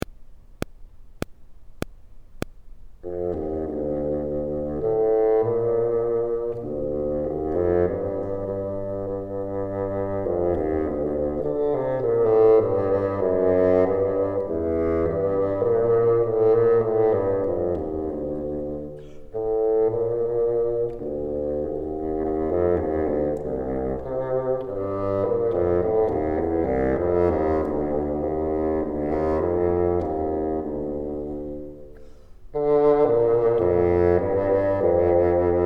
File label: bottom, bassoon